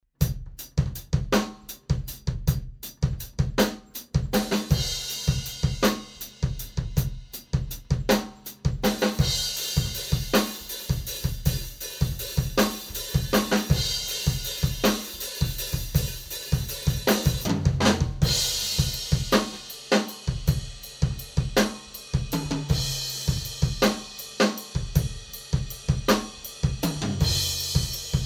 Room Mics 421

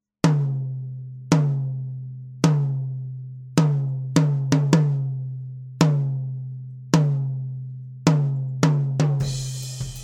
Tom Microphone Torch

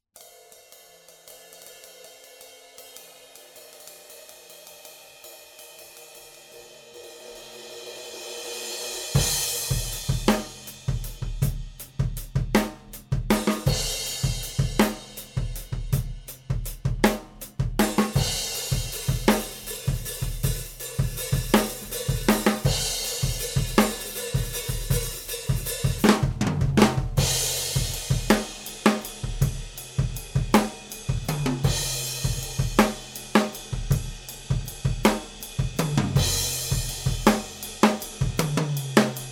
Drum Overheads C212; Audix CX212B; FET Condenser

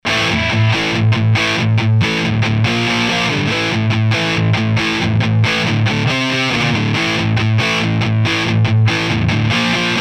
AMP DIst

